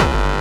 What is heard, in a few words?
Hard Kick